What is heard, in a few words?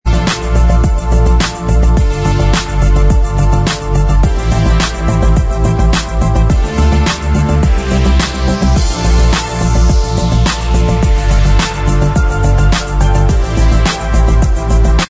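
instrumental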